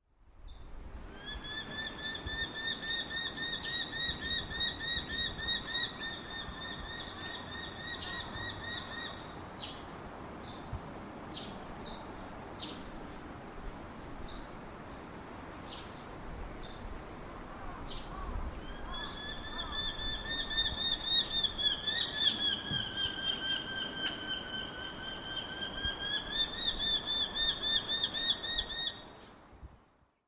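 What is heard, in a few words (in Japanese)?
chigohaya, hobby; morioka; koe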